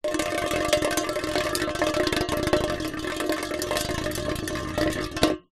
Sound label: foley tin roll concrete close up